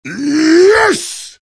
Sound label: cheers; heavy; source engine; tf